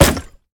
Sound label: weapon; Catalyst